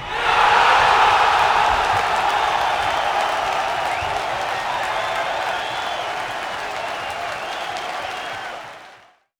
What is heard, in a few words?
ARENA HOWL; Rosegarden; TV3